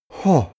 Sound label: male sigh impressed; menschen; koerpergeraeusche